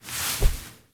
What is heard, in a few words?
Foley Idle Alert Foot Down; Enemies; Kubrow; Warframe